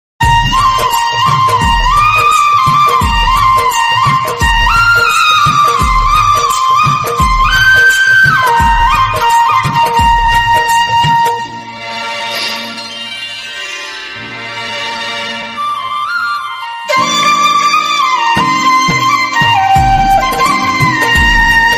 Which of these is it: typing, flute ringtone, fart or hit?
flute ringtone